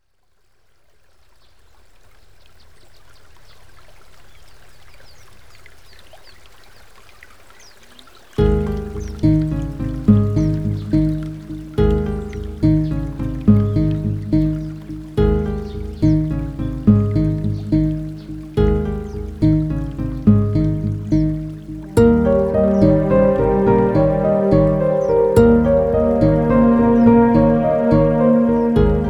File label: resonance